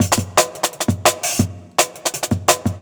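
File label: Jungle Breaks, 170 bpm; Martial; Original Jungle Breaks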